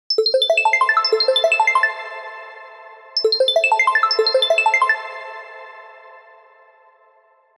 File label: Attentive Warp, ringtones; Alcatel 3 OT-5052D